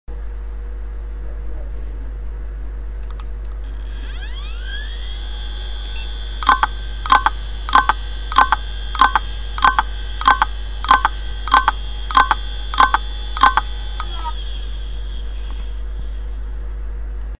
Stuk vinchestera FUJITSU vyzvannyy neispravnostyu bloka magnitnyh golovok

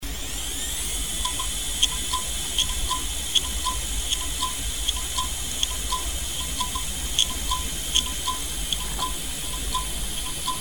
Stuk tonkih modeley (DiamondMax Plus8) vyzvannyy neispravnostyu bloka magnitnyh golovok

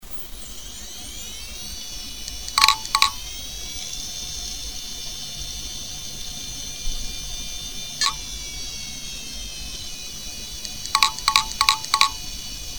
Stuk vinchestera QUANTUM Plus AS vyzvannyy neispravnostyu bloka magnitnyh golovok

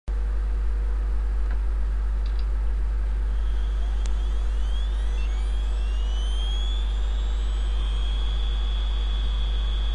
Zvuk vinchestera IBM bez rasparkovki i rekalibrovki

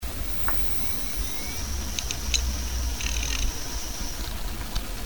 Zvuki izdavaemye polnostyu ispravnymi vinchesteromi tolstyh modeley; (DiamondMax Plus9)

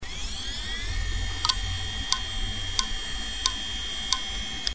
Zvuk vinchestera FUJITSU pri potere adaptivnyh nastroek

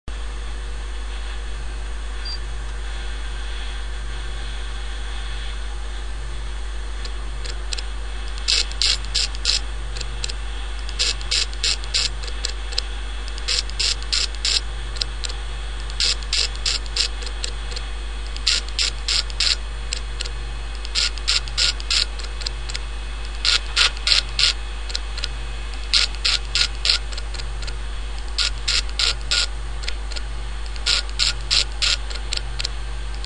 Zvuk vinchestera IBM pri narushenii kontakta mejdu kontrollerom i germoblokom

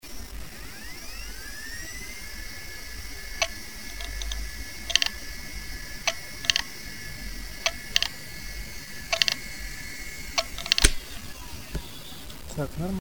Stuk vinchesterov Seagete U series vyzvannyy neispravnostyu bloka magnitnyh golovok

